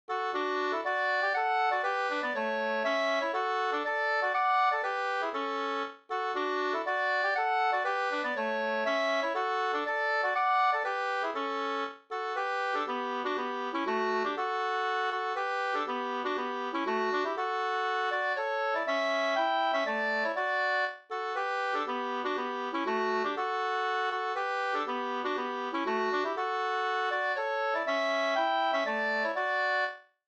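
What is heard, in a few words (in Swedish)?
polska, tvåstämmig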